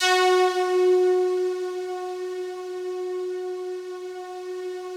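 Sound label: FOG ATTACK F#3 Left, Yamaha VP-1; Music Store; 90's Sample CDs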